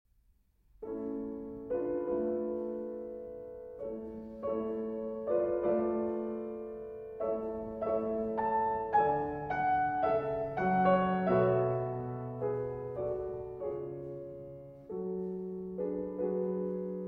Trio in D Minor II. Andante Espressivo

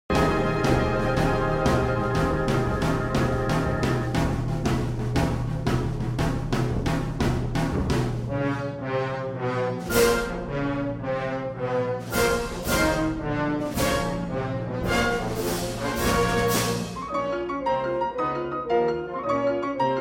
Chamber Orchestra, Concerto for Piano; Croatian Chamber Orchestra

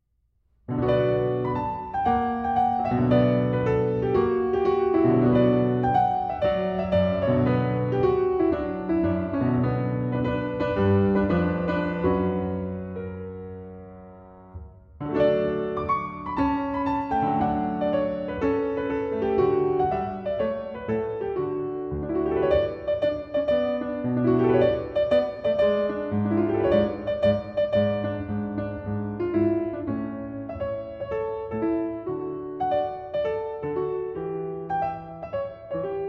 Keyboard Sonata in B Minor, Allegro Moderato

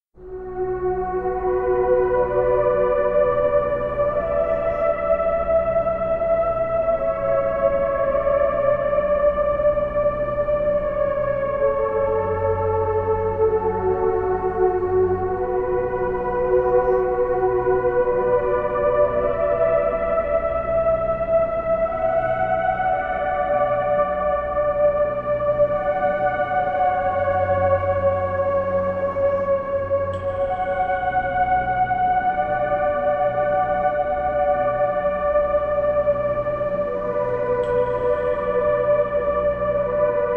Ambient Space Music

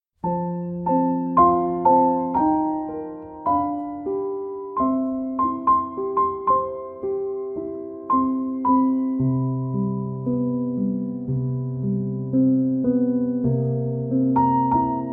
Instrumental Piano

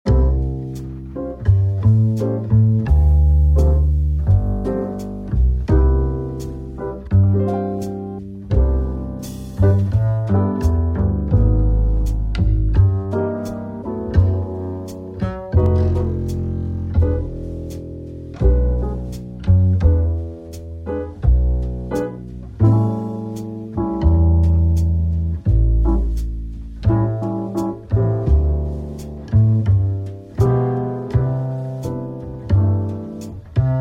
Morning Jazz Background

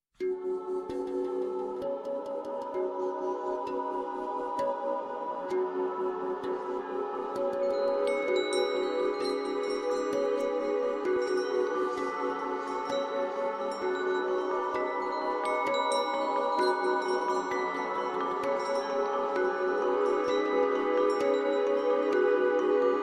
Tuned Percussion, Harp; Wingbeats Source IV

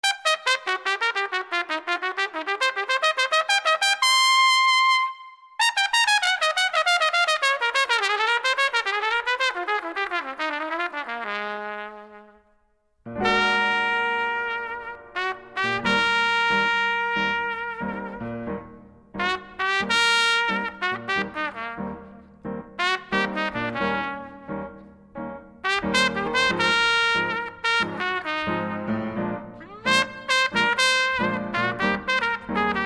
Trumpet & Piano Duo-Jazz Version

